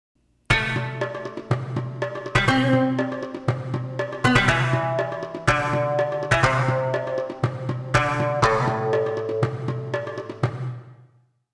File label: Arabian; xp-80; roland; roland demo cd-3